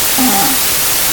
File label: sample fart plus noise